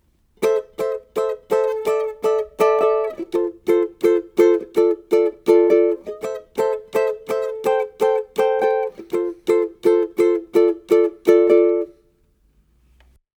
Verse Strum, ukulele

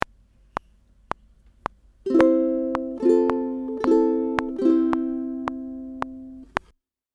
Strum, ukulele

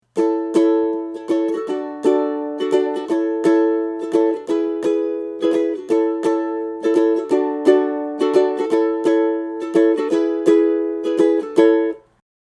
Strum, ukulele

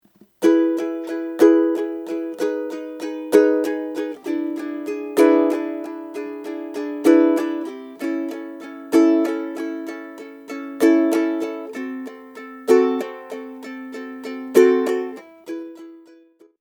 Strum, ukulele

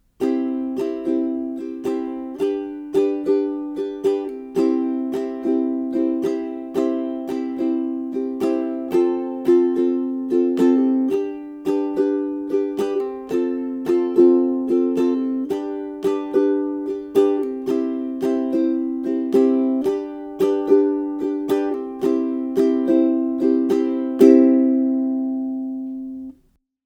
Strum, ukulele

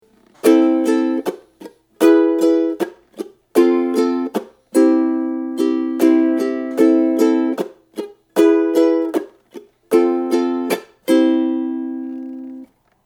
Intro Strum, ukulele

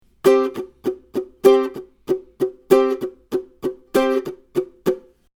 Strum, ukulele

